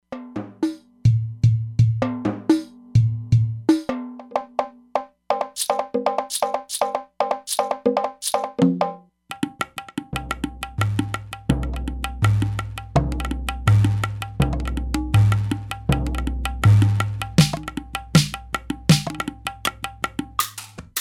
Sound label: Percussion, E-mu Proteus-3; Factory patches